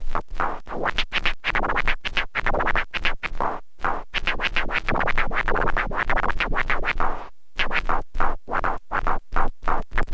scratch long, Vinyl Attack, scratches

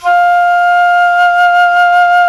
Jazz Flute; Roland LCDP04 Orchestral Winds; 90 s Sample CDs; Jazz+Singin'

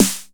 909 SN HI SNP

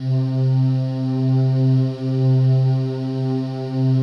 PAD, DIGI-PAD

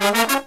Brass Riffs; USB Soundscan vol.29 - Killer Brass Riffs; AKAI

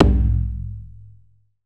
China, ChinesePrc; Akai MPC500

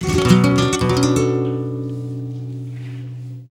GUIT, Gipsy

